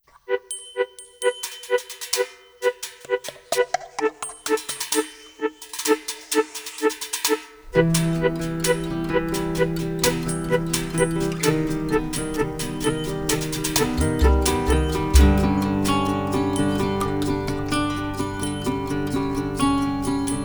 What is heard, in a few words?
Music Cues